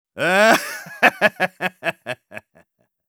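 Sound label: Medic laugh evil, German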